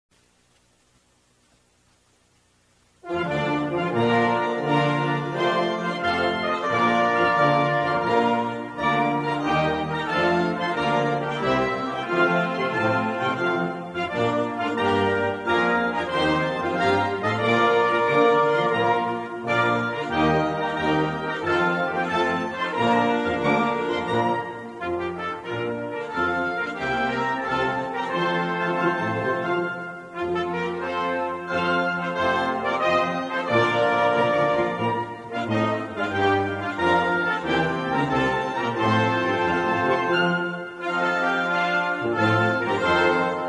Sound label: National Anthem of Cameroon; Hymne national du Cameroun; Nationalhymne Kamerun